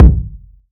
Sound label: reverb steel kick drum b key